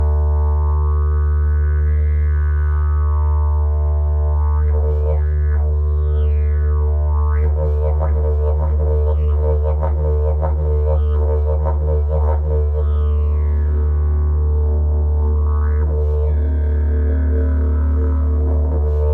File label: didgeridoo; jilm svetlonos